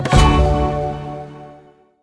Scatter Hit; aloha

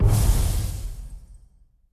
landing; mercy of the gods

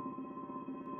reel Spin

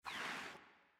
bricks Discard; cashomatic

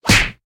continue Button Click; wild wild west

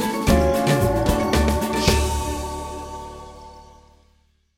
fs Outro Start; alohaxmas

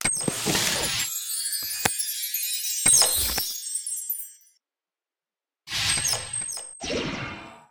chest Picked; fairymirror

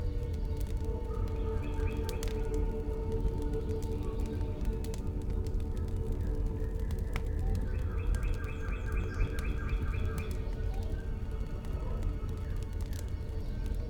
intro Free Spin Loop; fairyred

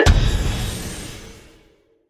scatter hit; jungle spirit

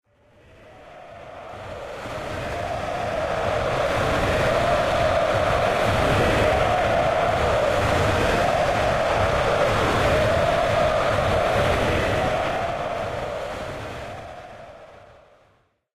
crowd Chant Turkey, football

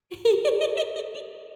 fairy Giggle End; fairymirror

